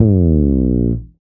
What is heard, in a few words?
slides, bass; Spectrasonics; Bass Legends CD 1